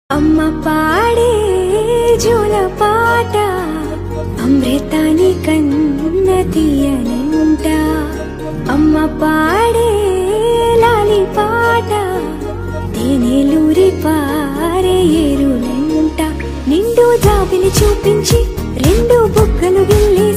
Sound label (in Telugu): telugu amma love music